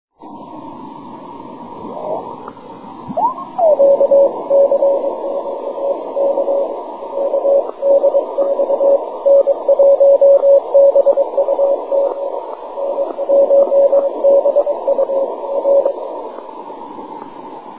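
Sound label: 6m continuous wave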